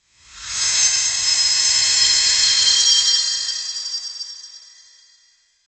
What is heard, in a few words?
glass shattering; human sounds